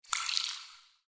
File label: click result; kho bau